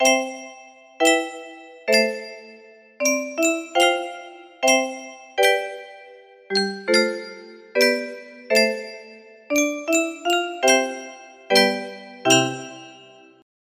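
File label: music box melody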